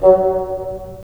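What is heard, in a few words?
wind bassoon; LA Composer vol. 3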